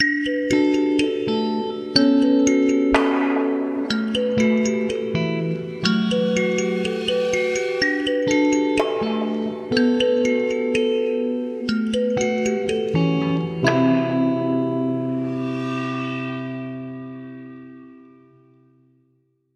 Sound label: nature; curious expedition 2 macos ps4 switch windows xbox one xbox series xs gamerip 2021